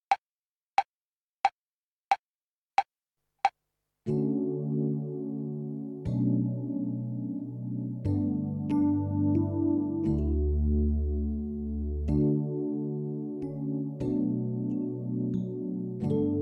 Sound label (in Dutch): Afrikaans wiegeliedje